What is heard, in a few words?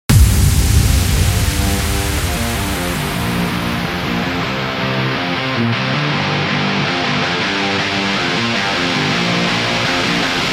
Energy Sport; sports training energy